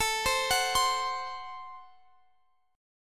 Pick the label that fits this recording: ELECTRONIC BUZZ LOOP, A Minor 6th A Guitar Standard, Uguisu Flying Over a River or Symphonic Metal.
A Minor 6th A Guitar Standard